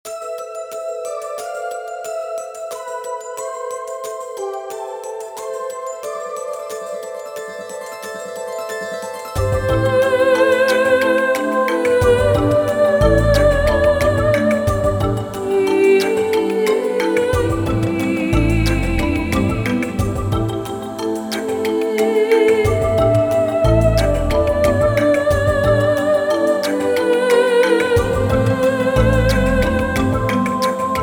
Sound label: Organic Trance; Brand X Music; Brand X Music (Volume 2)